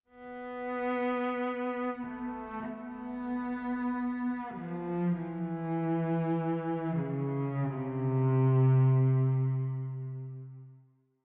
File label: Stinger Short, game soundtrack; Pillars of Eternity; Stinger Thaos Short Building Block 02